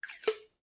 Slide Down Pop; SoundsCrate

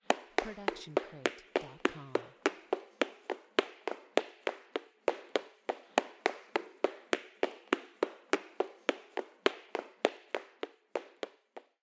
running indoors

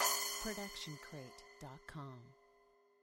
splash cymbal 10 inch; soundscrate

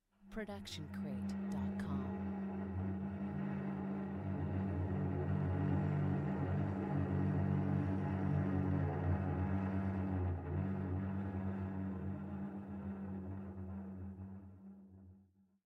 Cello Scary Movie; SoundsCrate

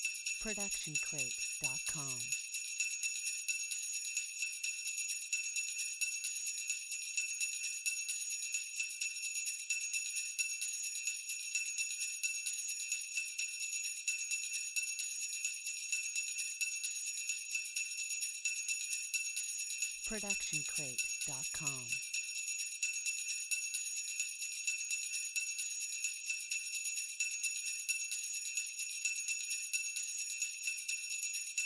christmas sleigh bells; soundscrate

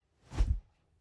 Fighting Woosh; SoundsCrate

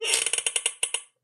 Chair Squeak

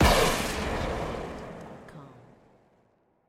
Gun Shot; SoundsCrate

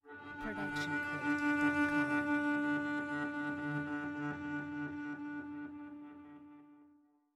Cello Octave Flutters; SoundsCrate

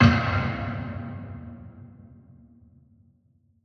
designer dark wood hit; SoundsCrate

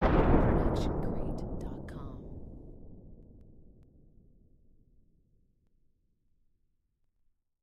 Sonic Rippling Explosion; SoundsCrate

